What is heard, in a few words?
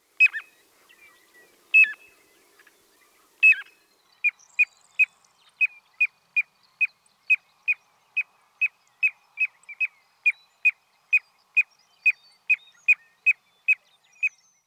Piping Plover